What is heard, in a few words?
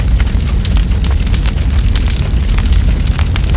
fire; Quake 4 Alpha; id software